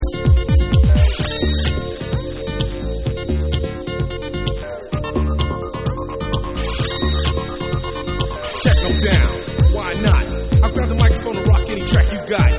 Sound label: Instrumental Mix